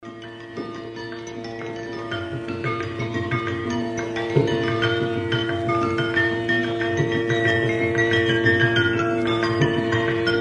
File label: jalatarang